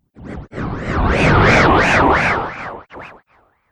Ghost Wide Motion Whoosh; Whoosh Sound Effects

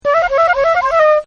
FLUTE, Woodwinds; Musical; Partners In Rhyme

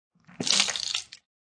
Water Splat, Water splats; Objects

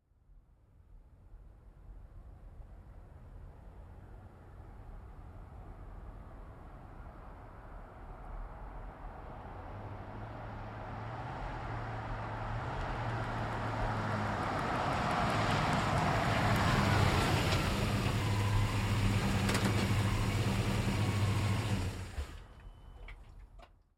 Truck GMC Savana Up Stop Med; 2010 GMC Savana; Trucks; Vehicles; Big Room Sound

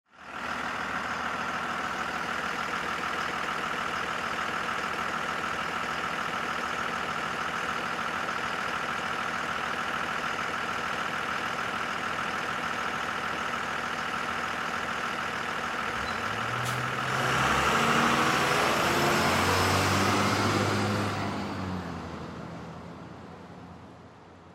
School Bus Idle Drive Away; Transportation Automobile; Busses

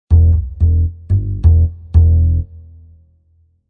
Jazz Bass Loop Ending D#m 180 bpm; Jazz bass loop in D# minor at 180 bpm; Bass Loops

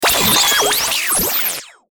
Stinger Multiple Frequency Sweeps; Production Element Imaging Element Accent Transition

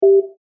Menu wrong, Wrong / Invalid Selection; Menu SFX; Buttons; Multimedia; Stuart Duffield

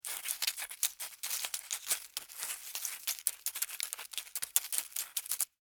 Insect Dig Dirt, Insect, Dig, Dirt, Bug, Movement; Animals:Insects; Miscellaneous Insect; Blastwave

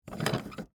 Metal Hinge Open, Foley, Metal, Hinge, Open; Latches; Objects; BlastWave

